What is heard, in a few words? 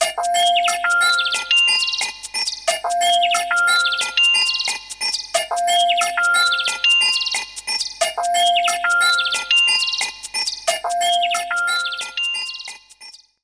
Jungle